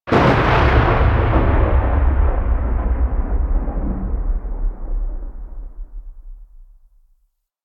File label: Invasion Thunder; Warframe; Cetus